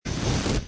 fire Burn; merge-dragons-2

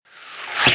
throw Get; merge-dragons-2

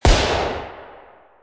end Explosion; merge-dragons-2

